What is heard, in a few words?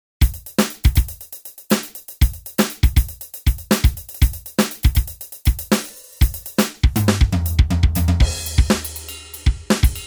funk